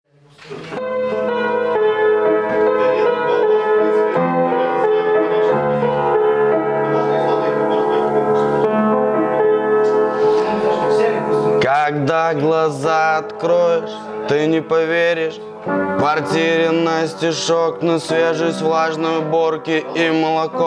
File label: piano version